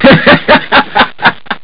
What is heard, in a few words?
laugh; ST-XX Amiga Soundtracker Sample Packs